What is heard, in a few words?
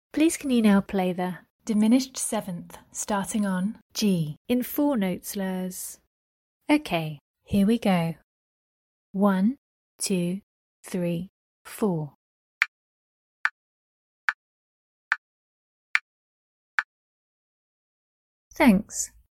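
diminished 7th starting on G 4 note slurs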